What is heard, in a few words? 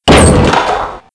Firedoor; Playable Tutorial Level